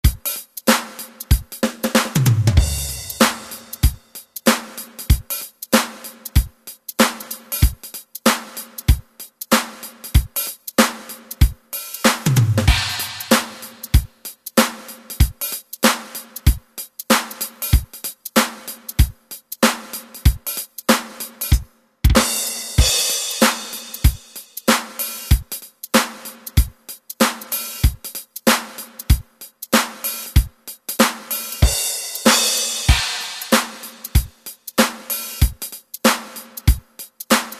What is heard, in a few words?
Funk